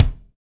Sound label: BD, DR BD; Best Service Gigapack 2